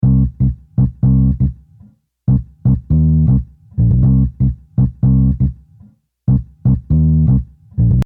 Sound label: Bass